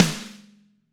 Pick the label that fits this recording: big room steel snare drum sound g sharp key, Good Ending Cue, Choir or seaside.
big room steel snare drum sound g sharp key